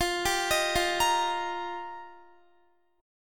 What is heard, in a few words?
F 7th Suspended 2nd Suspended 4th F Guitar; F7sus2sus4; 4E,4A,5D,5G,5C,6F; arpeggiated